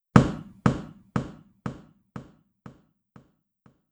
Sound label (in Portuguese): batida echo; processamento de audio